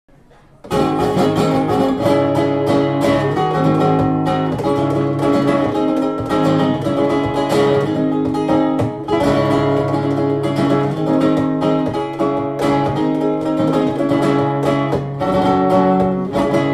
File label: tango del negrito, guitar; live